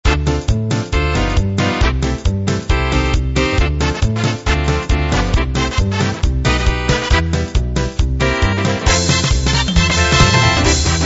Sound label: broadway